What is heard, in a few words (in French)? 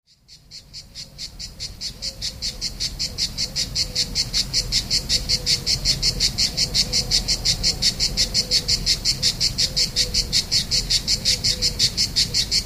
Enregistrement Cigales